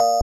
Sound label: BELL